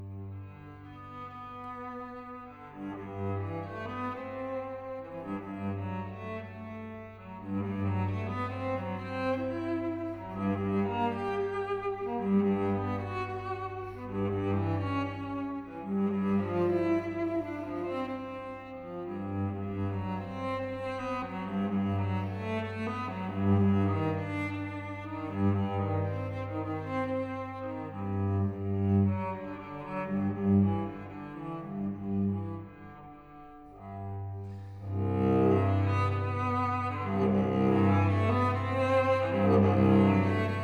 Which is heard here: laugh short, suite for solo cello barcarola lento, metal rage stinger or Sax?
suite for solo cello barcarola lento